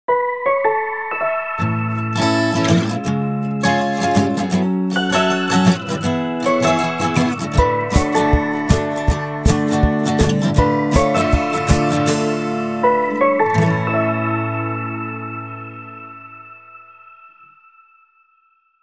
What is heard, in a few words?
Mobile Ringtone Folk; SFR